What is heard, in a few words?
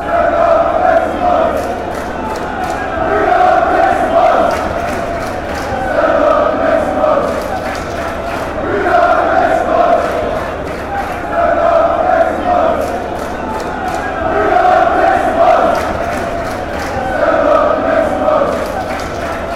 genk chant